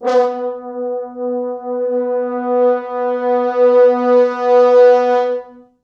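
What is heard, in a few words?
4 French Horns, Horns; East West; Quantum Leap Horns Sax; 4 FrHorns MOD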